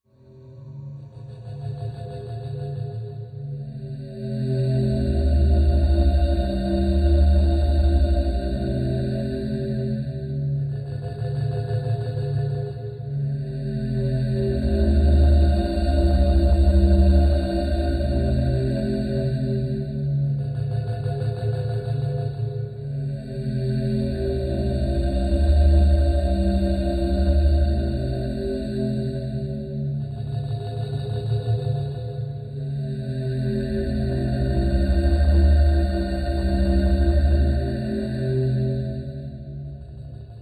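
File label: Ozone Pulse Slow Low Air, eerie ambience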